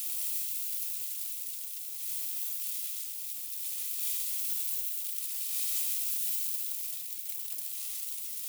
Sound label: gunpowder pour; teardown; ps5 windows xbox series xs